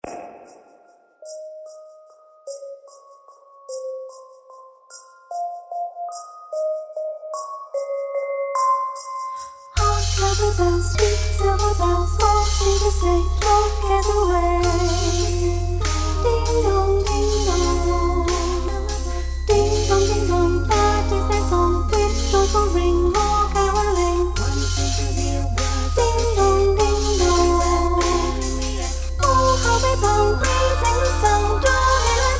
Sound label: Carol Of The Bells Soprano; rock choir resources